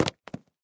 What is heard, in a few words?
falling carrots